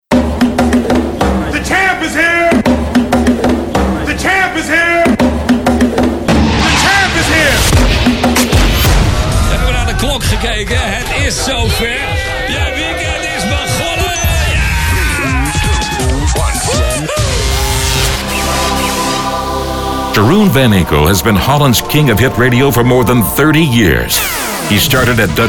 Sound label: jingles; VAN INKEL; NOVAZ AUDIO IMAGING